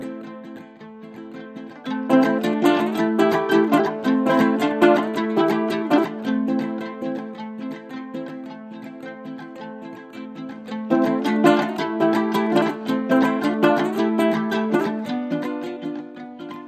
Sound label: Is Calling Jazz